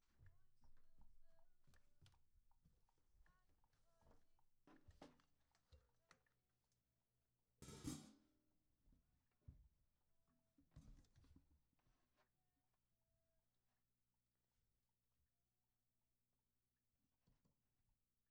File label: perc intro hat